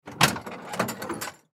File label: Semi Truck Interior Door Open; Trucks; Industry; Semi Truck Mac E7-460; Blastwave